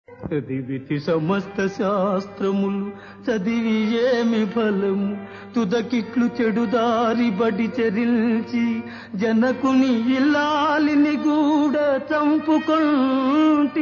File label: Padyam; Chintamani